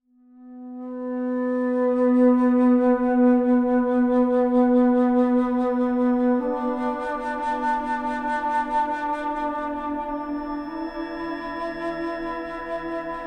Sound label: 333 Hz